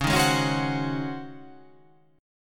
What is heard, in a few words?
Csharp Major 7th Suspended 2nd Suspended 4th Csharp down, chord